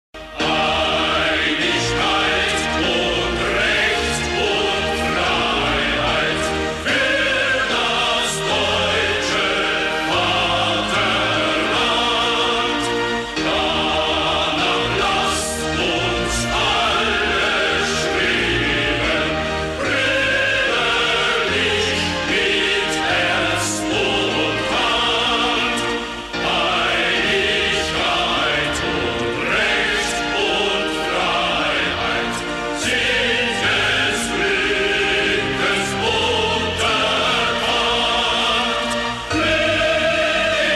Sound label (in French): Hymne national allemand